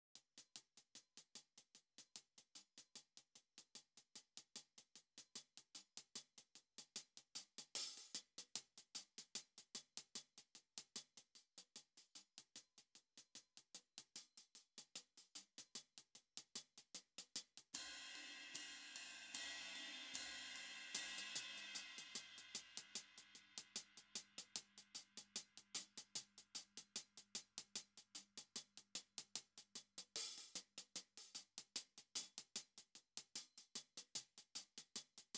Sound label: Slow Jam Kit